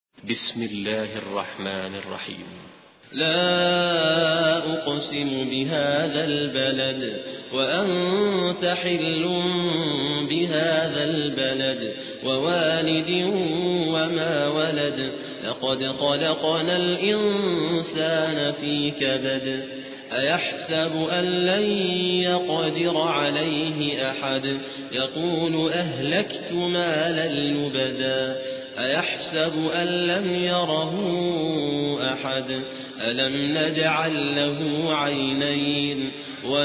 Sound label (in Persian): ترتیل قاری سودانی; سوره بلد